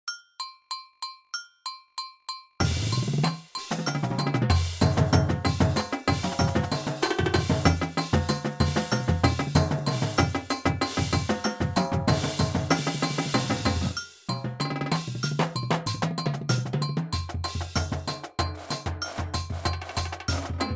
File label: 8s Tag 190bpm, drumline